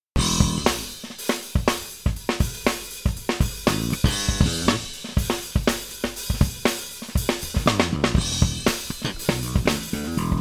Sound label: COOL JAM ~Stormy Saxophone~; Iori Yagami